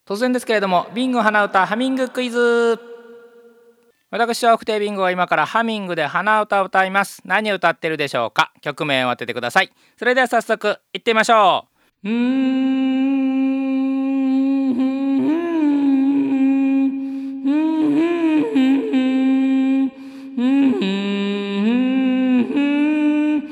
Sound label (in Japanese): hanauta